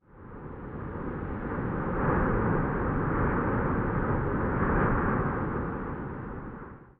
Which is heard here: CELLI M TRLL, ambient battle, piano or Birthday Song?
ambient battle